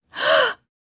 female gasp; CosmicRage

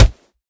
kick, combat; CosmicRage

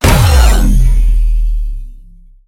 hurt; CosmicRage; combat; creatures; cylarkan

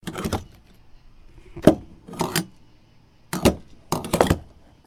attach pump, ships; CosmicRage

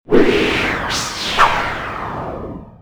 drone launch; ships salvaging; CosmicRage

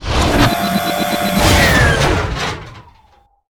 done, trolley

